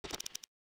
Folder row open; Samsung; GT-S8600 Wave III; EffectTone

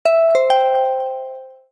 Beckon, notifications; Honor; X5

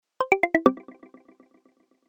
shutdown, ui; Samsung Galaxy-Dart-VTR

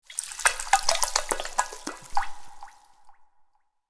Water alarm; Nokia; audio themes

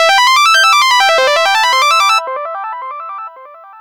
Beep Dreamy; Pantech Vega-Secret-Note-2; sky ringtone

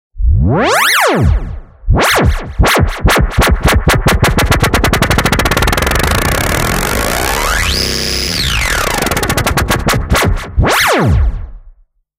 Retrobot, Nokia Tones; Windows Phone apps

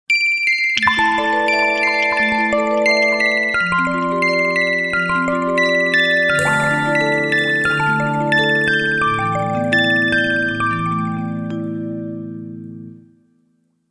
Water Language, alarms; Power Rage

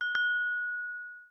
Wine Glass Toast; Samsung; Galaxy A56

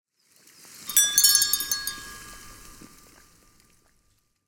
Aquamarine; Nokia; Oro Belle

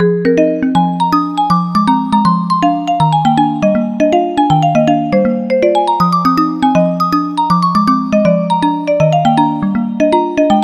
Fairy Tale, ringtones; Xiaomi; Black Shark

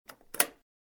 Screen Lock; Balmuda

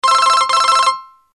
Alert; Motorola; RIZR-Z10

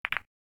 circle mosaic lock; L18VC Classic US Celular; LG

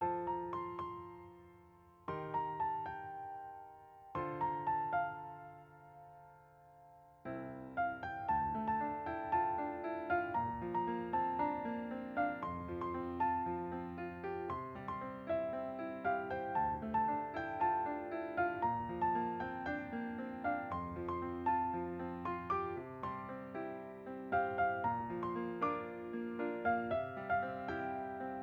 Piano; Honor; X9c; alarms

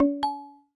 recording start; IUNI U2

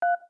DialPad, Beep; GS390 Prime; LG

